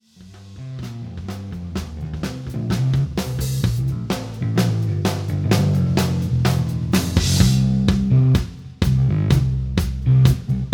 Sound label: Drums and Bass